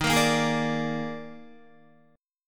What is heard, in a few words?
Eb Major 7th Suspended 4th, Guitar; EbM7sus4 strummed; 10,13,10,13,10,10; 4F,4A,5C,5G,5C,6E; JGuitar